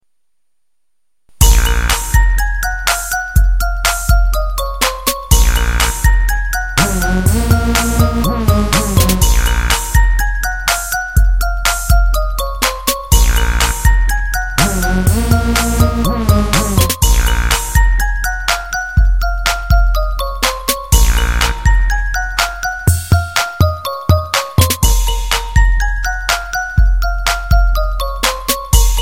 Dirty Bell

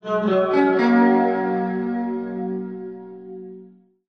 Sms Display

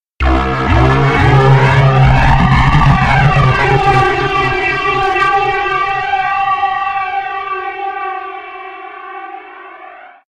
Echo Sms

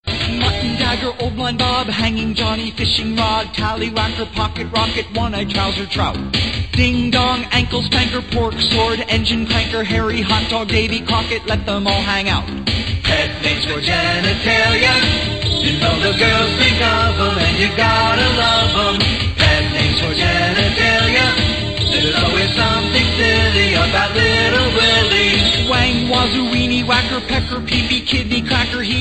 Male Part

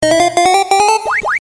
Techno; Pacman

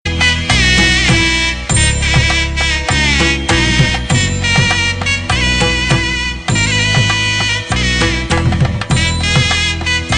Tabala Flute

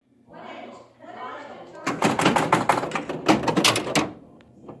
Pinball

